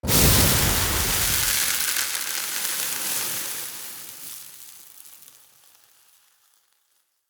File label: Fire Hazard Water Extinguish C; Undercity